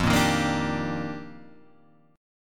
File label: F 13th Suspended 4th F, chord